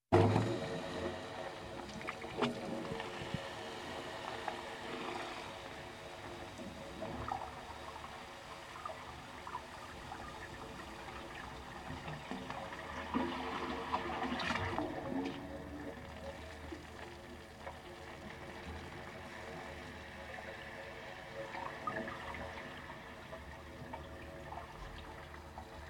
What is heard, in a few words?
Wave Organs Sweeps And Trickles